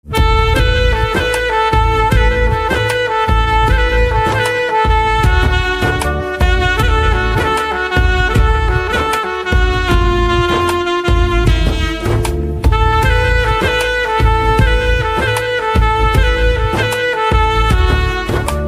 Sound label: Saxophone, instrumental ringtones